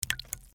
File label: water drop